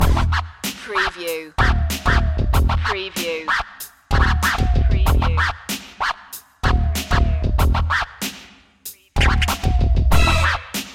hip hop loop

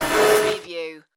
robot servo malfunction

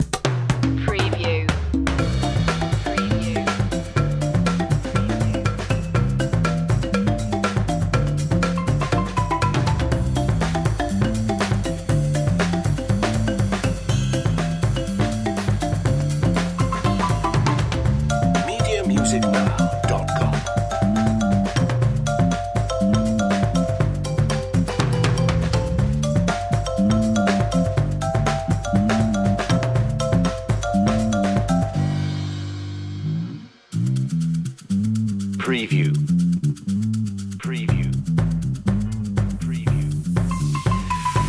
no guitars

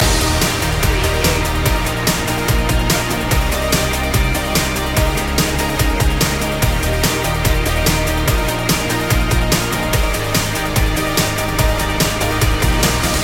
fresh energy loop

